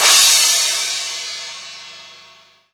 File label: Crash DMX Real, Cymbal; One Shots; Drums; Ableton Live 12 Lite; Core Library